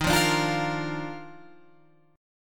Eb Major 7th Suspended 2nd Eb Guitar Open F; strummed; EbM7sus2

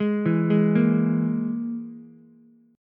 G sharp Suspended 2nd Sharp 5th, Ukulele; G#sus2#5; arpeggiated; JGuitar

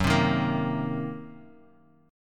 Gb 6th Flat 5th, Bass Standard; strummed; Gb6b5; JGuitar

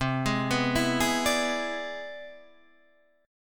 C Augmented Major 9th, Guitar, Half Step Down

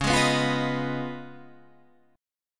Db 9th Db down Guitar Standard; strummed; JGuitar

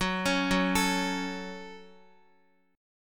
F sharp Minor F sharp, Guitar; arpeggiated; JGuitar

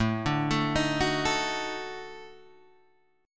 Eb Minor Major 7th Flat 5th

